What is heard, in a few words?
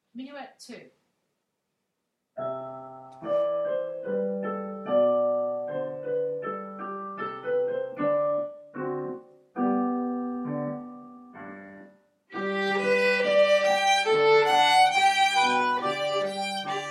Minuet tune harmony, Strings; Level 1B